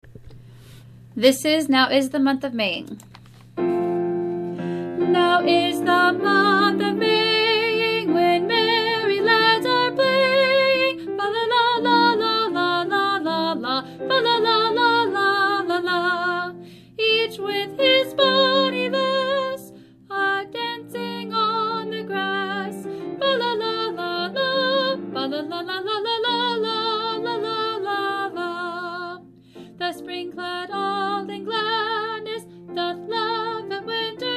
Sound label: solo alto